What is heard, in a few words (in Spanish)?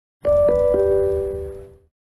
tram de alicante